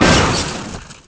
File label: crash brk